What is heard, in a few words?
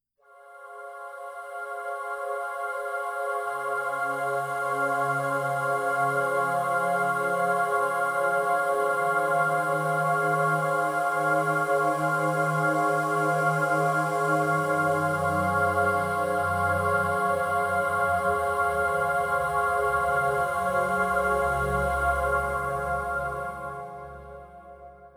drone choir Db